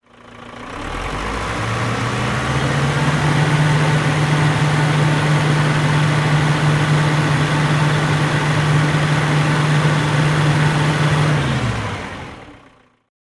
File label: tractor idle; bobcat